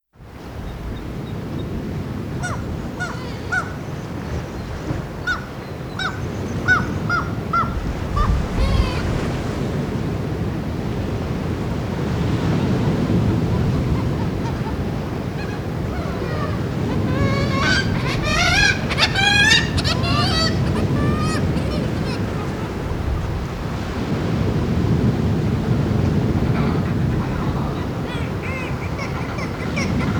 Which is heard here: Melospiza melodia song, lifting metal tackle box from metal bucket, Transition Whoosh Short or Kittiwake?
Kittiwake